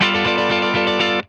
G JANGLE, Funk Guitar; Zero G - Funk Guitar

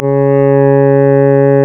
C#, Synclav FM, WIND; Big Fish Audio

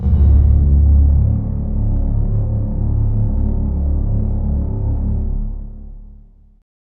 FX SWEEP

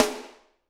SD, Drums; Bob Clearmountain Drums I

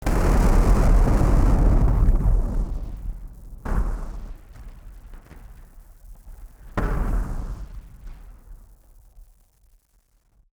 Party Popper FX

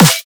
drum snare nerdy smack; Virtual Riot; Drum Hits

